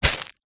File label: foley plastic bag empty hand grab; zapsplat